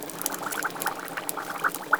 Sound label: lava cauldron loop; emitters global